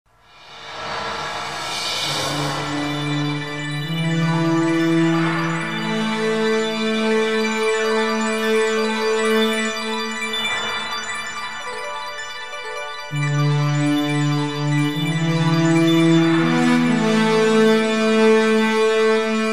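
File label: for sport; Звук фанфар на начало праздника, на выход ведущих, или перед награждением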